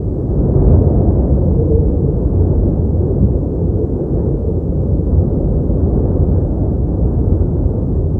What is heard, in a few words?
cold wind